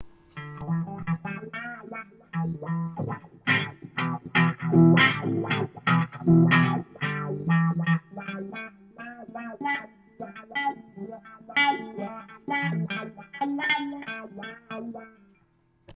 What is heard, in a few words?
Guitar